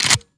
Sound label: rechamber, sh; reloads; iw8; romeo870; gmod